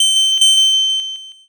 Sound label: bike bell